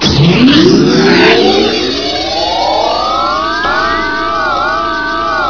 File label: energy startup, ambience